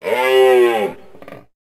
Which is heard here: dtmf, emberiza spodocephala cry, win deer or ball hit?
win deer